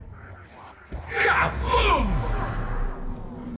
zombie kaboom; cod

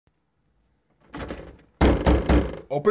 knock open up

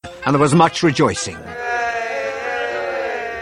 much rejoicing

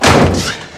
slammy slam

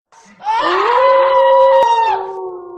auuuuuuuh

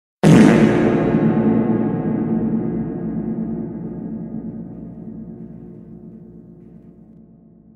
fart with quite a lot of reverb

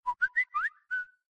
samsung whistle notification sound

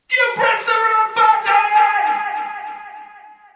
wrong button

